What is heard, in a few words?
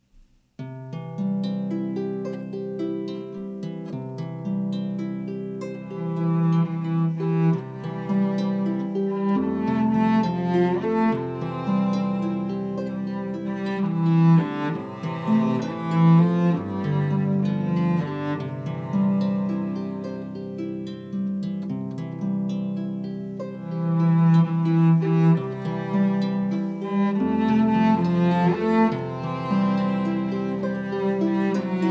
O, Holy Night cello